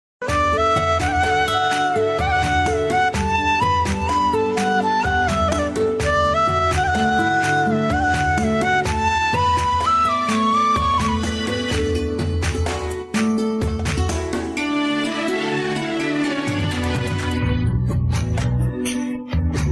nadanpatu Malayalam Nadan Pattu Vocal Removed HD Karaoke